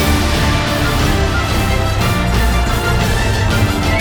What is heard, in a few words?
Big Win Loop; netent